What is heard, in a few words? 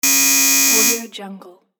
digital doorbell